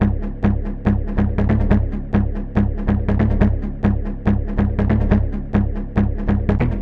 141 dark echo bass; 141 to 160 bpm

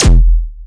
Syn Dr, Overloaded Synthetic Drums; style kits

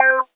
stabs hits; Melodic Stabs and Hits

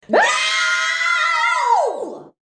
diva bwaaaow chorus; Cheesy Divas; VOCALS and SPOKEN WORD

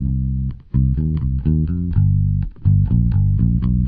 bassline walkin my pluck; BASS LOOPS

